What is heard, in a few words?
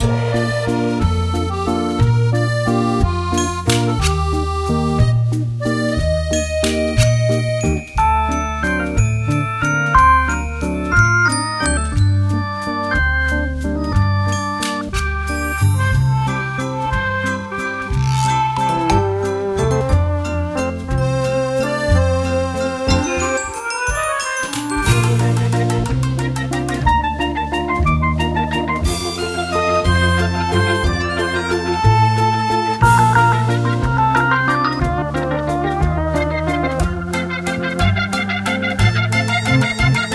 Cat Lady Crepes (Gameplay); Cooking Dash